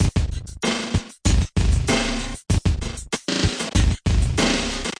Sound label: 96 overloaded tape groove, drum loops and breaks; 091 to 100 bpm